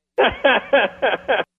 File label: Laugh; Kevin Eubanks